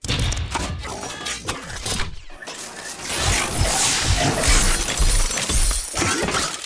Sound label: Loot tick